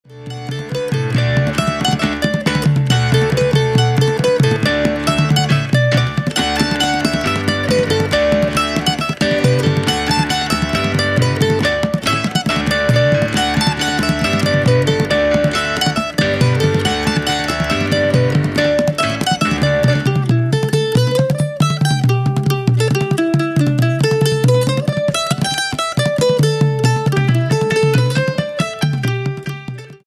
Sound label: Peg Ryans Polka Ballydesmond 1 And 2, Irish band